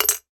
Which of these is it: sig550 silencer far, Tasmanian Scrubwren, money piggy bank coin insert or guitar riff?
money piggy bank coin insert